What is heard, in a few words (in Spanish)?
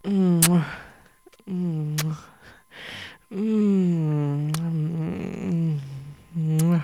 beso mujer gemir